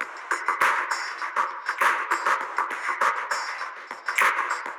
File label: Ghost Loop 100BPM, drum loop